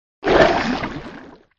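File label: scolopendra attack voice up; skolopendra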